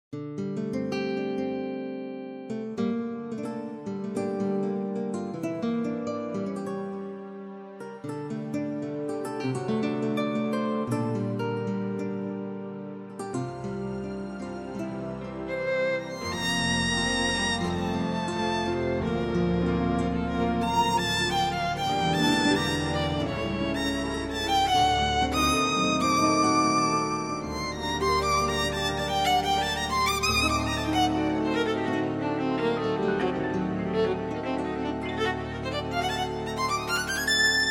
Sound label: guitar strings